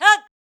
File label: rally beep